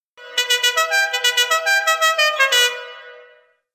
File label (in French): son la cucaracha, klaxon